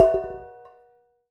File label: Prepared Drums